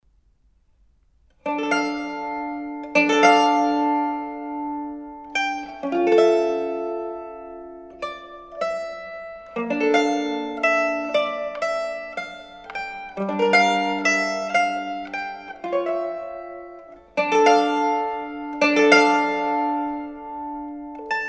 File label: Suite Sarabande, mandolin